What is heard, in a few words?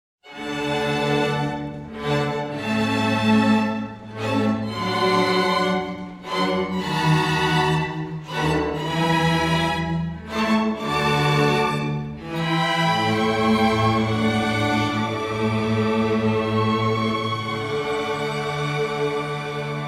Concerto In D Major For Four Violins I Adagio